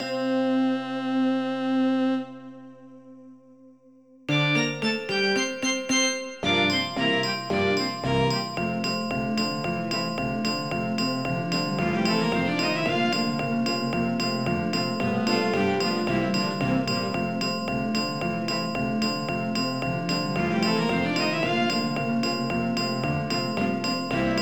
jingle

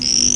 Frog beep

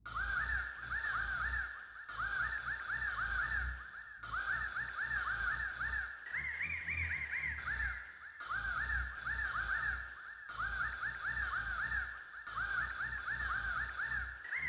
tweet

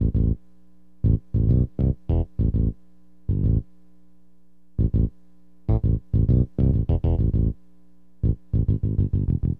Bass, Acid Jazz Funk; LiveAct Pool 1

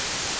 Static; RSIXDEMO

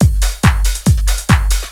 Tech, Drums; TechnoMusicMaker

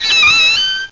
rusty

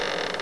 squeek; SysError

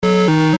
wrg; monster shop

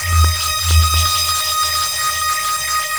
SPHERE D+B 160, Drum+Bass; eJay

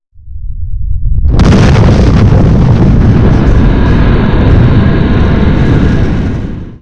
EXPLO BIG; PC Gamer IT CD 48; Big bang

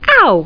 ow

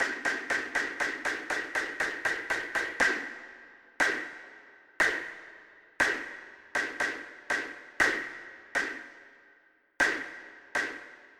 Lasergun

